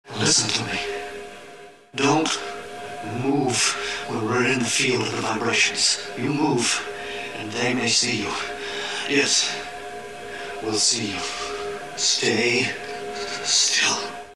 Alien Warning; Speech clip; Crowd Chamber

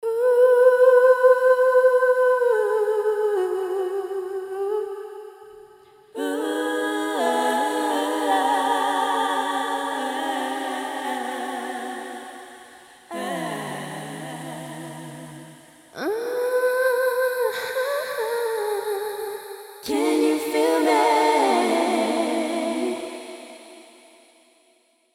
A Cappella wash

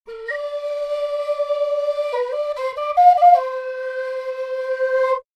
Flute Ambience Warm DRY; RaySpace

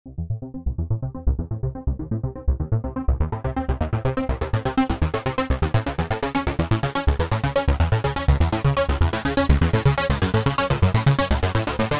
Arpeggio Synth (dry)

